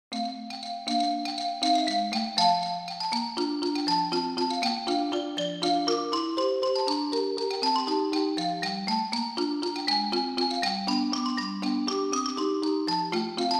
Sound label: waltz; weeklybeats-2014